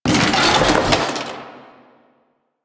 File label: chair destroy; ambience; Warframe; Entrati